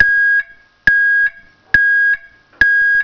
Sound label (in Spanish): tono; sirena multitonal 8 sonidos o 70mm 24v cca; Módulo de sonido Ajustables